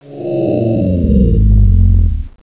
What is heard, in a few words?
breathe; svencoop; gruntsparty; garg